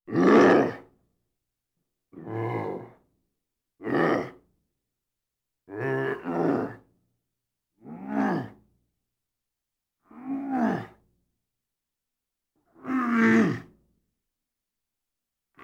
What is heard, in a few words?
Man Groaning; horror